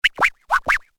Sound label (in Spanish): sonido scratch